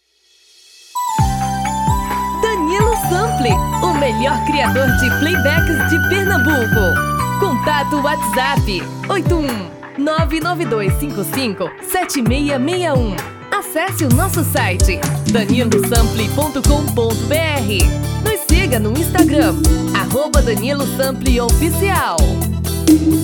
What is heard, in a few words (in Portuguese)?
Brega